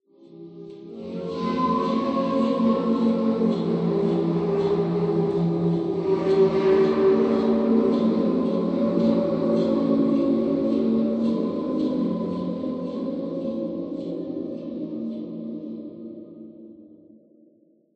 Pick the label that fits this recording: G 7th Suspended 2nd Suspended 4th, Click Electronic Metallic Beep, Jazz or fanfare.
Jazz